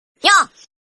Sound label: notification; yo one piece